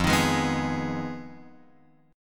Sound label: F Minor 7th Flat 5th, Guitar, Open F; Fm7b5 strummed; x,0,3,3,2,3